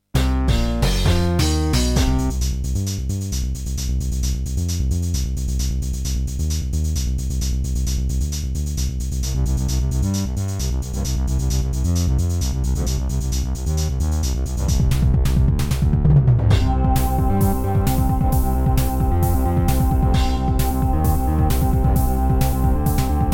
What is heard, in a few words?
Serdaco McFly; sigil doom episode 5